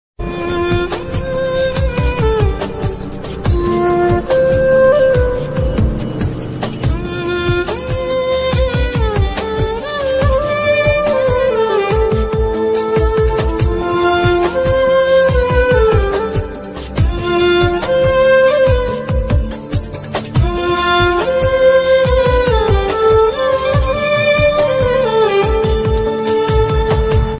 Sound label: malayalam bgm love bgm